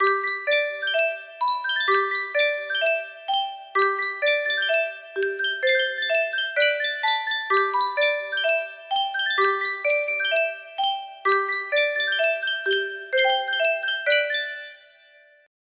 Sankyo music box, music box melody

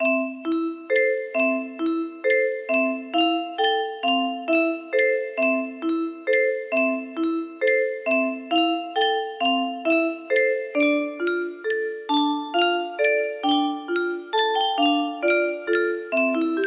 melody

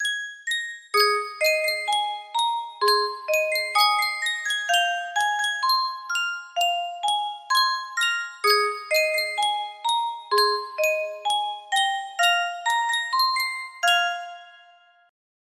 Sankyo Music Box, music box melody

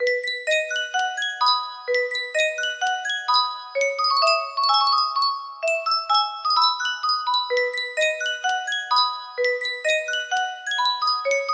Yunsheng music box

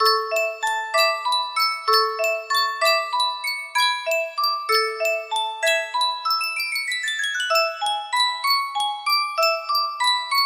Sankyo music box

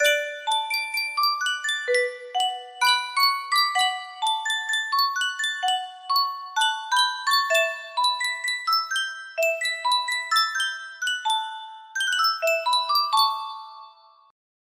Sankyo music box

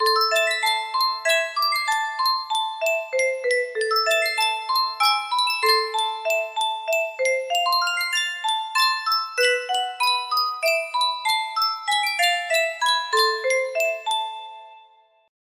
Yunsheng music box, Chopin Prelude; op 28 no 6

